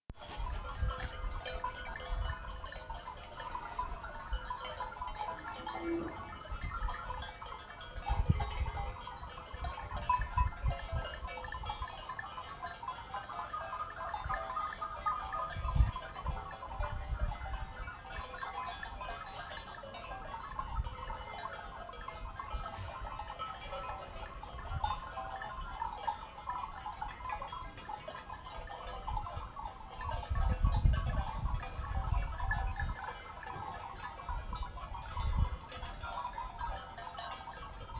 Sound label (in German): ziegenglocken